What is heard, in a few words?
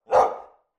dog labrador single bark internal